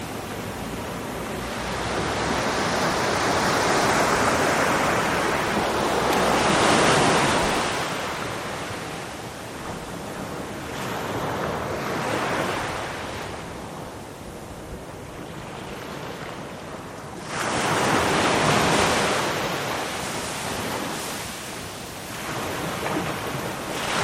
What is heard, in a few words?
waves multiple